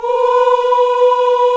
VOX, African Aahs; Roland LCDP11 Africa VOL-1

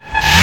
REV; DOLBY#3 REV

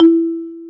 XYLO E 3; XYLOPHONES; AKAI S-Series CD-ROM Sound Library VOL-7

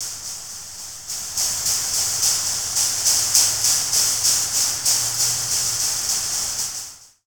thrash metal; SFX

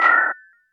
Percs, Elektron MachineDrum

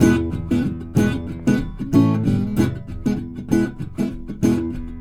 Blues Guitar, RIFF

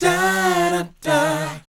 BOYZ C, Vocal Planet R&B & Dance; ILIO

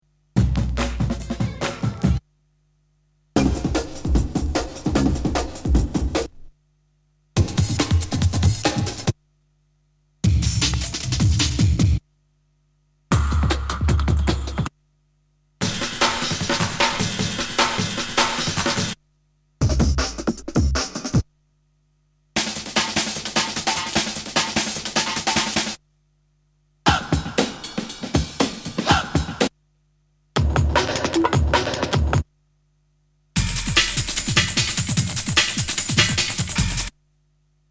Breakbeats; Kniteforce; 1000 Samples Volume 1

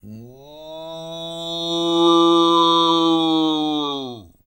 TUVAN COW; Spectrasonics Vocal Planet; Jazz FX

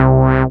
SYN SH, Roland; Sound & Vision - Gigapack I CD 2

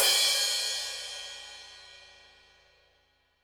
HiHat & Crash, Roland TD-20; HiHats

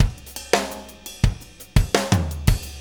Drum Tools, Loops

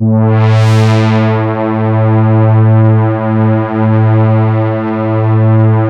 MONO PAD; PADS

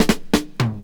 OLD TRAP; E-MU Formula 4000 Series Vol. 2; Dance Set 2

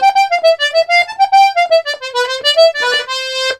BOUREE, Accordions; USB Soundscan vol.40; AKAI

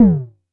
vermona drm1 mk3 tom

